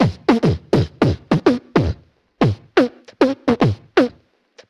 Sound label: Beatbox, Drum loops